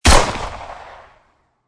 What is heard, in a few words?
gun shots from a distance